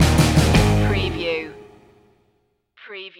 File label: breakin waves stinger